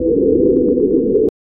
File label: a spaceship docking to a, space station